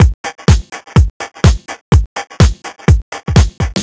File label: Electro Haus Half, Drum Loops 125bpm; Nu Dance Electro